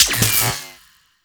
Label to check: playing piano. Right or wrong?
Wrong